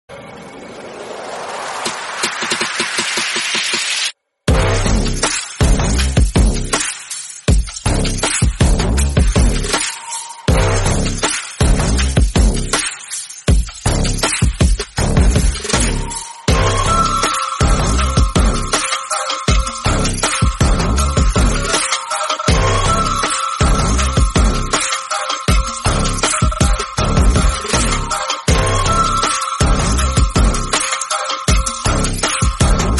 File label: trap sound remix; maanaadu